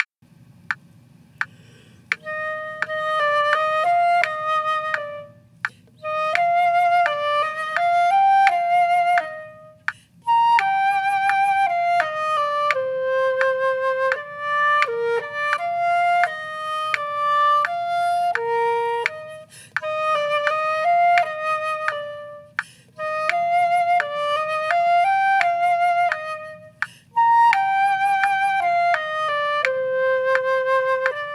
duet, duets for winds; andantino; mozart; compatible duets for winds by clark

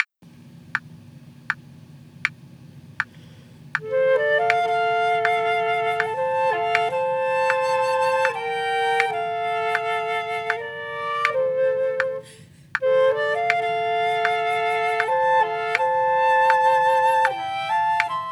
duet amazing grace, duets for winds; compatible duets for winds by clark

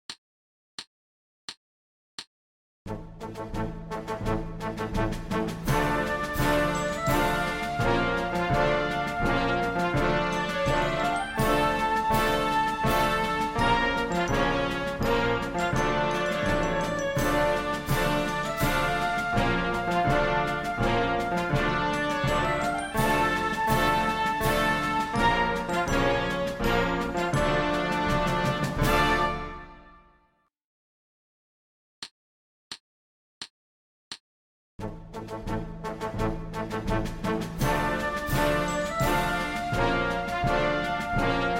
short version

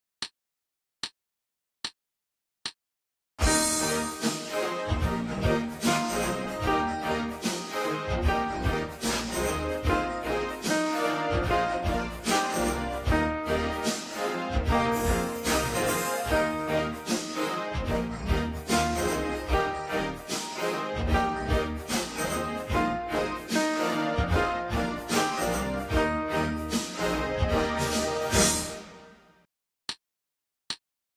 74 bpm

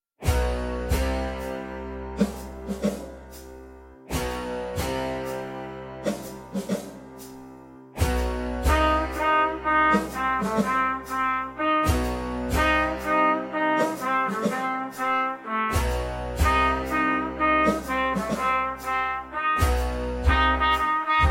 trumpet, slow

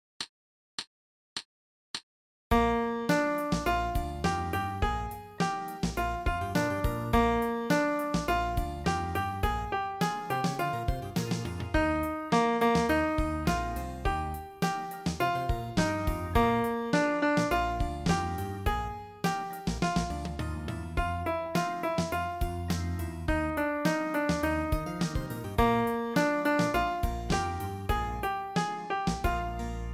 blues adventure 104 bpm

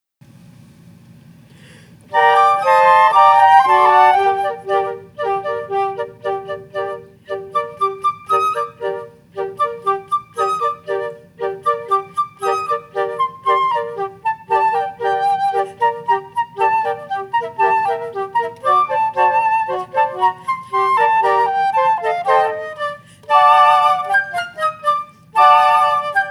quartet w percussion; the barber of seville; rossini; flexability classics

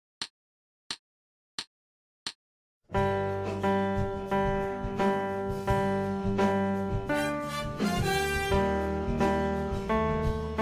lean on it 88 bpm

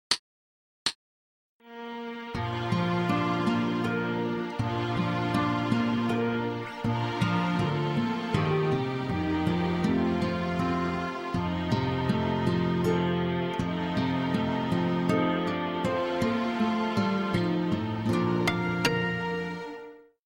lullaby, playalong

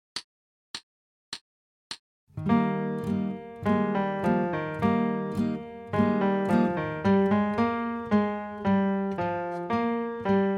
musette

